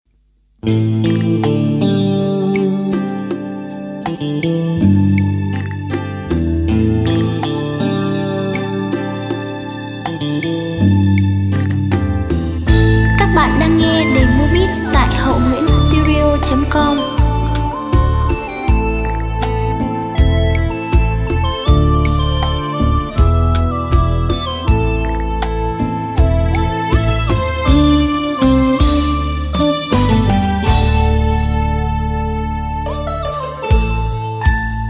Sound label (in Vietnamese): beat